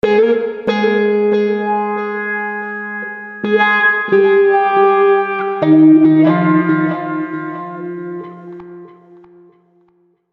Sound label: Reggae shot